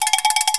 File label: BELL; psion cd vol1